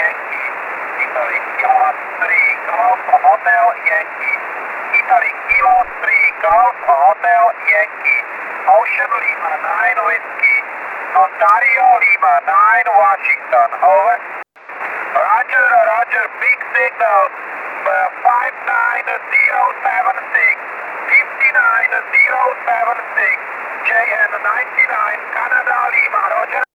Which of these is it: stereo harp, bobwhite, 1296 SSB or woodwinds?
1296 SSB